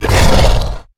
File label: Exertion Light; Evolve; Goliath